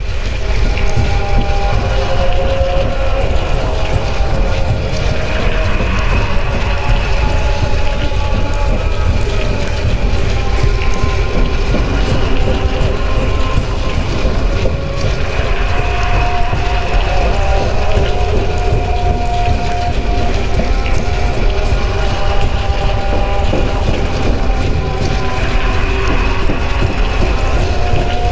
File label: Loading Music